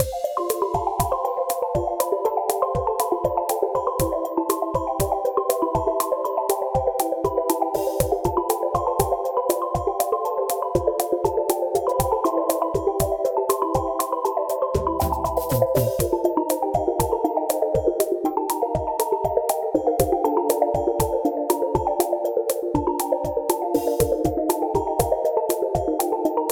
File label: Fishbowl Circus, funny